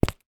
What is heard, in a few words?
footstep single high heel; foley; zapsplat